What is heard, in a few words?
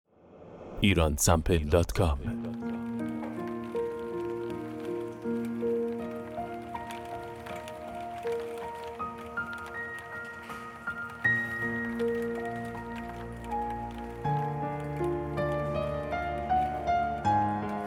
rain; Iransample